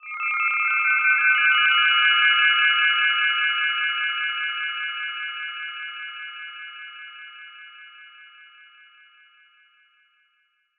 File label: Sci-Fi Electronic Eerie Ringtone; Science Fiction